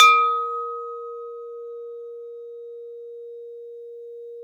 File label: TUB HB4, tubular bell; SoundBytes - Early Patches